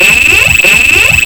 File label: phaser; Digital Culture Stream - Volume 4A; digicom